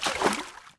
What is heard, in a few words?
splash, footsteps